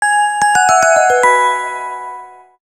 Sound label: Bellatron; Sony Ericsson; F305; PlusFourSix; Ying; Lars Edvall